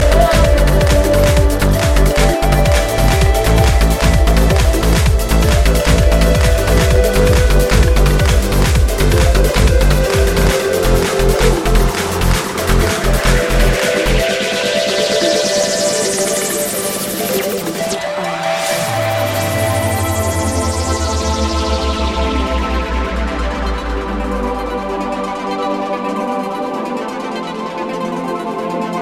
Soft Dj

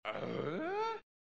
AEUHHH

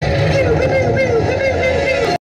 pew pew; Sonic

